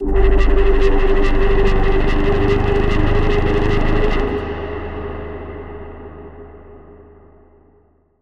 Revolve; SFX; Routed Sound; Spire Expansion; Chaos Bringer Vol.1

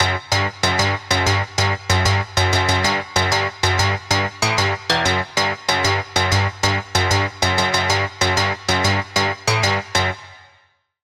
Pluck; Routed Sound; Spire Expansion - Doom Carpenter Vol.1

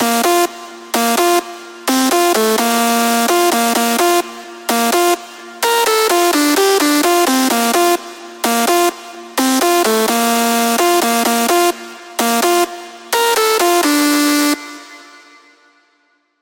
Lead; Scorching Power; Routed Sound; Spire Expansion; Flame Summoner Vol.1

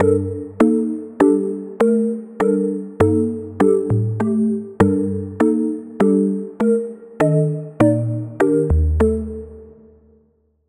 pluck, Sylenth1; Routed Sound; Sylenth1 Expansion - Street Vanquisher Vol.1

